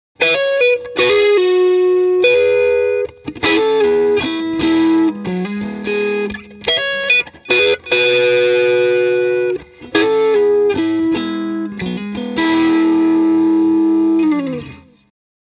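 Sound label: m75 tele neck pickup jtm45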